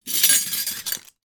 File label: household several items of cutlery pick up